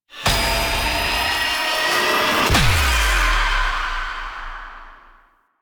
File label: Zeal Pre Teleport Cin Burst; Warframe; Emissary Season Two